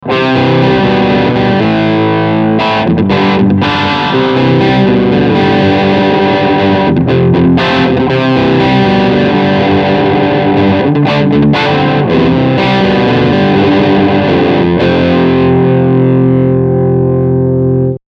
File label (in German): Steg HB Riff Overdrive